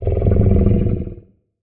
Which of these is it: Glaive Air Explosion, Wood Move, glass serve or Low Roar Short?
Low Roar Short